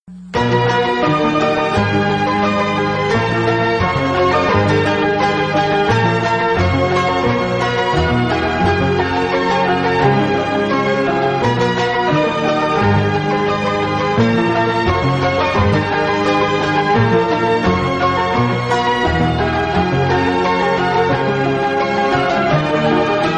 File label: Instrumental